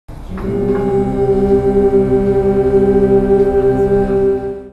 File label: acapela